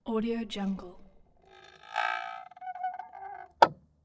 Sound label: old schooner boat dock line stress